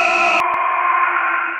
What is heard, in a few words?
"oooh"